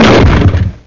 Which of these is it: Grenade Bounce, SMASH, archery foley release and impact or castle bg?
SMASH